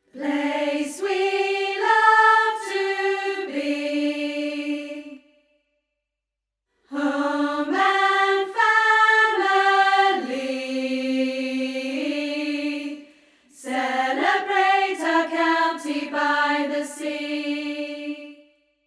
Counterpart for Chorus; Suffolk Song

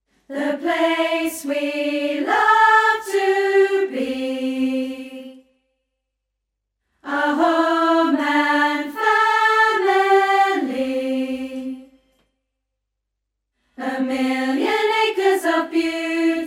Alto Chorus; Suffolk Song

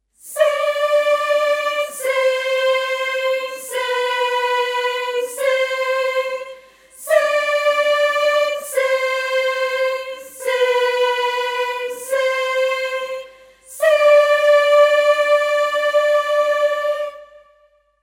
Soprano (descant) for end tag; Suffolk Song

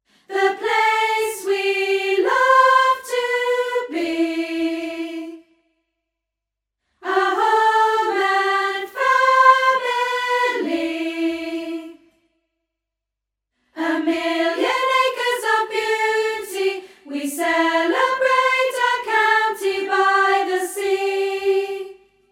Soprano Chorus; Suffolk Song

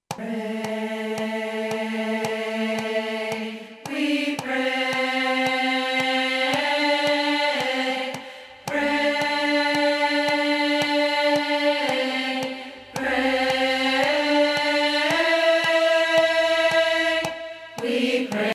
prayer for peace tenor

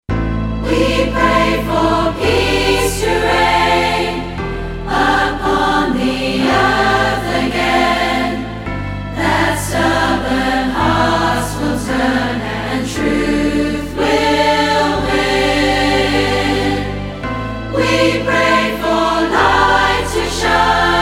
SAT chorus

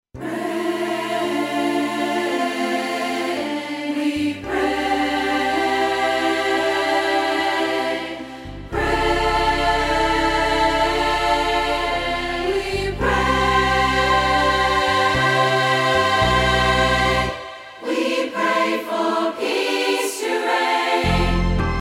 SATB bridge

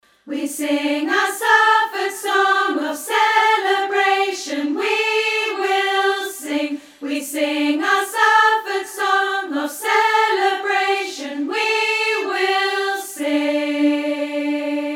Alto (melody) for end tag; Suffolk Song

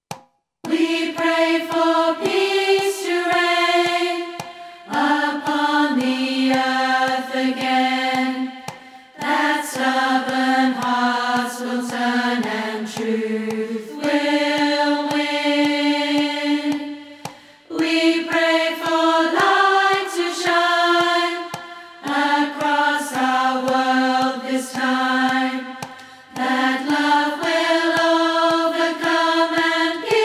prayer for peace Alto

